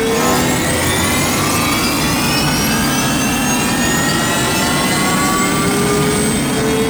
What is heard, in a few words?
Mech Slide Loop; Nechro